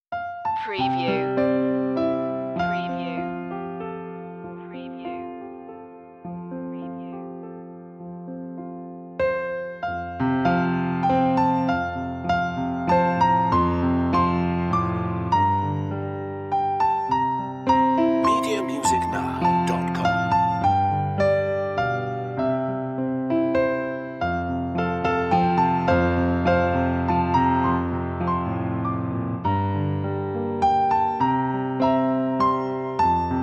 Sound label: away in a manger piano; media music now